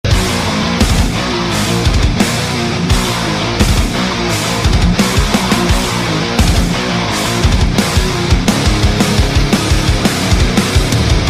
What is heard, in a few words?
Metal Breakdown